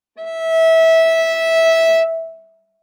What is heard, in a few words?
Viola arco ff sul D E5 stereo; MIS Pitches - 2014; Strings